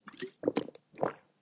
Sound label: Food swallow drink